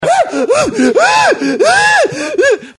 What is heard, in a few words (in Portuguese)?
gemido